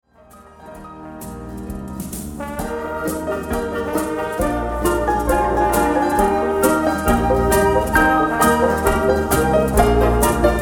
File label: SYMPHONIC SWISS SWING; symphonic instrumental entertainment- and film music